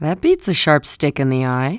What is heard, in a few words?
THAT BEAT